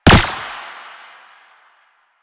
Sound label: Gunbrella Bullet Deflect, Weapons; Warframe; Tenno; Koumei